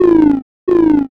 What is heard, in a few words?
crow